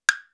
wood block

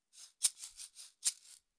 Ganza simple

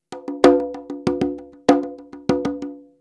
rumba, congas

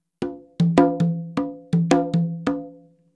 tumbao, congas

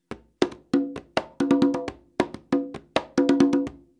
meringue, congas

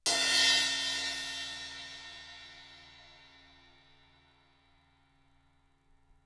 custom crash 15, cymbales

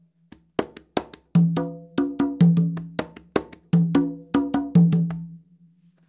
guanganco, congas

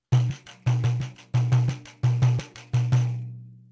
Cuica

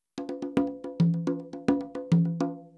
tumbao, congas

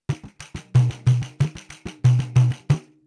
Surdo variation

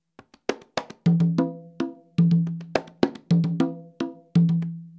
guanganco, congas

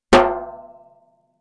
Djembe

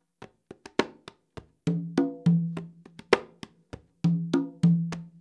cha cha cha, congas

